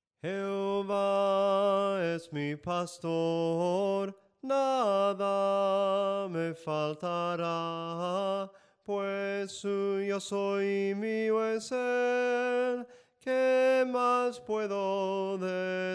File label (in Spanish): SOPRANO CANTADO, himnos en melodia soprano